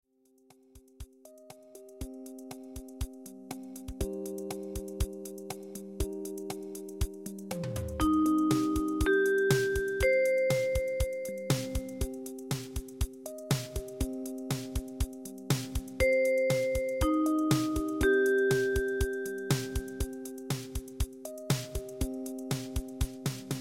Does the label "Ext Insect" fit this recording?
No